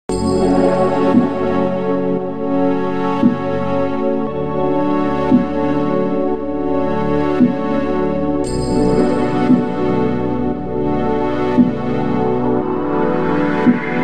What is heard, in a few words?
retro lounge